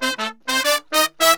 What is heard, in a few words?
100 AM L, BRASS SALSA